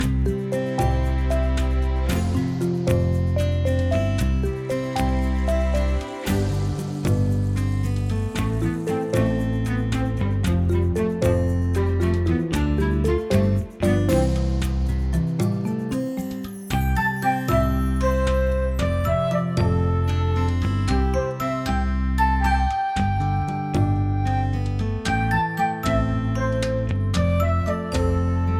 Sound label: Casual Game Music; Xanchuchamel